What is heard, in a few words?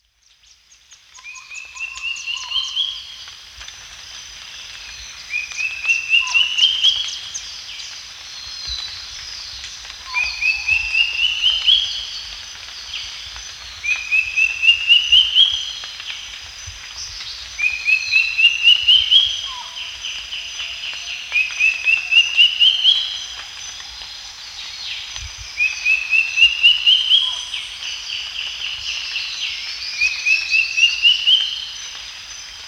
drongo cuckoo